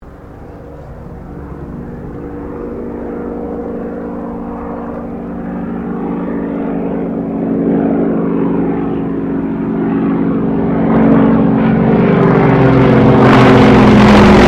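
AIRCRAFT YAKOVLEV YAK-52 PASS OVERHEAD DISTORTION, Russian Training aircraft; Airplanes (Sound Effects:Vehicles)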